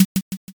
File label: m keytap